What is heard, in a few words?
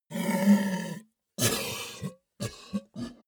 ogre dying groan cough; kreaturen